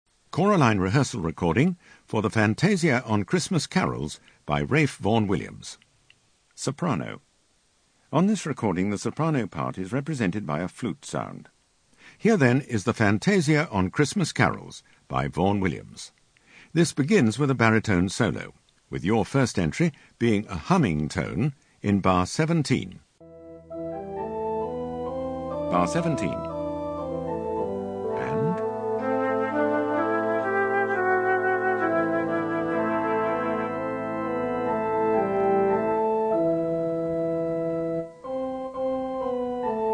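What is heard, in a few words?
Sop, soprano; Wllms Fantasia on Xmas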